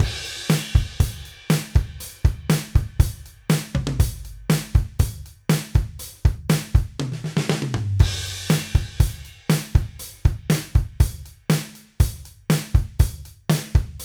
Drums

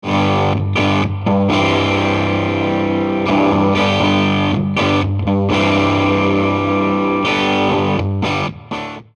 amp only vol 10

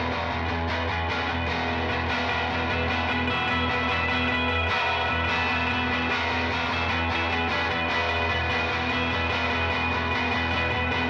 GUITARS Hardware

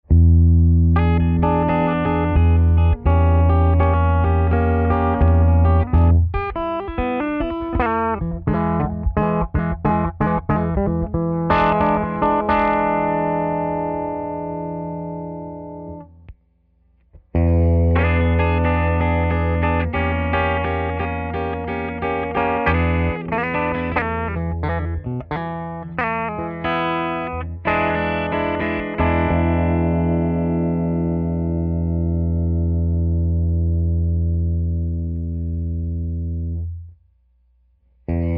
Bass Roll off

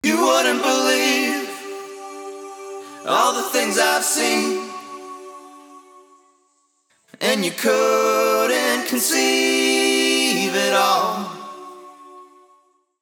vox buss

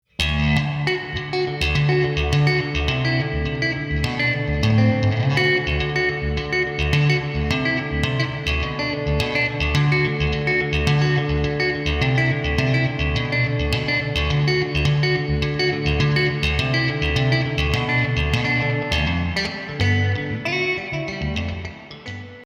UAD Capitol Chambers tele slappy

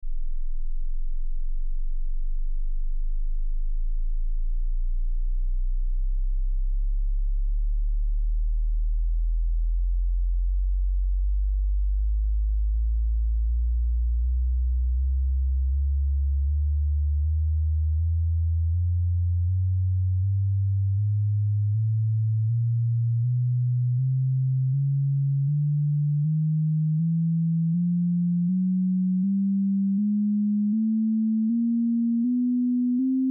Chroma Sequence

